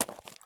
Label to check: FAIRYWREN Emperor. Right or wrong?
Wrong